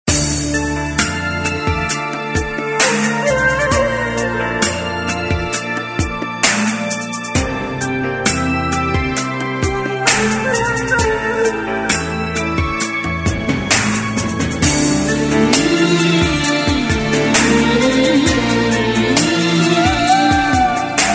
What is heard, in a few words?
Sad music